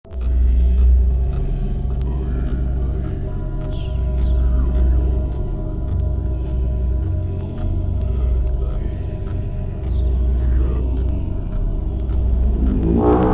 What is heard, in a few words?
Lesser Healing Chant; carpathian chanting